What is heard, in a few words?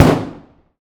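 lmg sup urb int close rear player; gmod; viper; atmo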